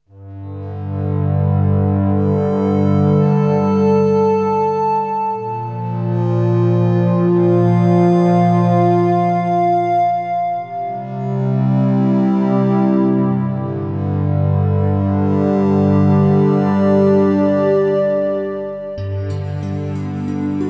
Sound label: ambient